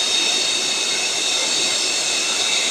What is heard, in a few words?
machine; 30 cc0 sfx loops